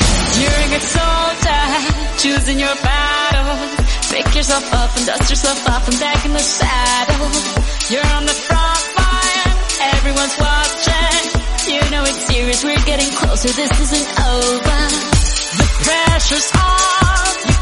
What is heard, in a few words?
127bpm Afro Fusion; remixplanet